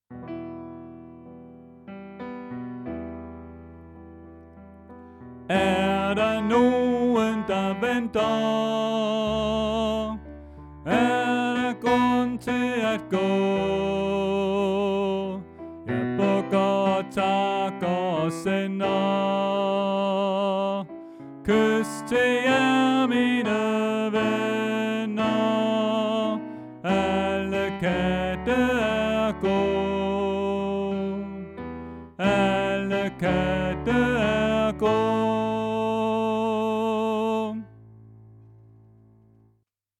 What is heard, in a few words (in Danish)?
Tenor